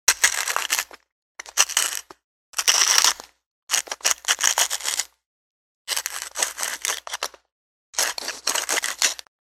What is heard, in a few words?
food pepper mill turning; sound effects one